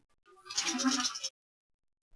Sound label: Chitter female lower intensity, loris